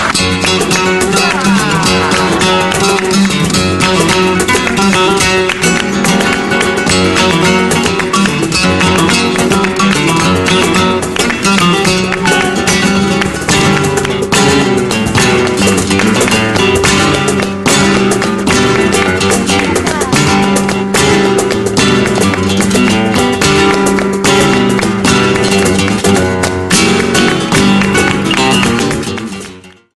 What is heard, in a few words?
Flamenco